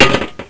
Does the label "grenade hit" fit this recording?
Yes